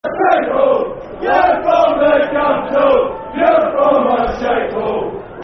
We're From The Capital [Football Chant (Soccer Song)]; Heart Of Midlothian, Hearts Hfc